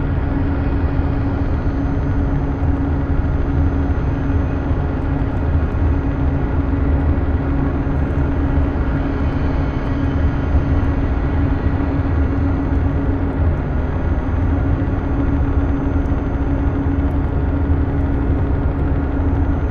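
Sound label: ambient transport ship hum; Stellaris; nemesis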